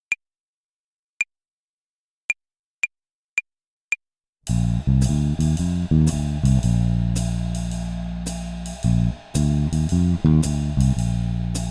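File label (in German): Swing Phrasierung Übung; play along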